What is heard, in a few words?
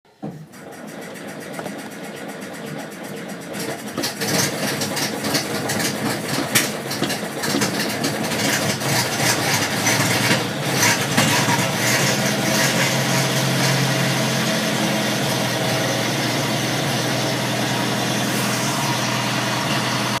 Cowley Start On External Supply